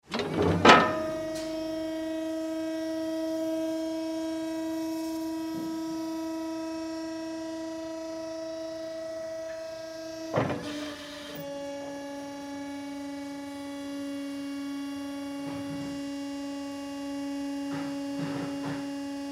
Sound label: Fork Lift Operate, Forklift; Fork, Lift, Movement, Beeps; Machinery; Blastwave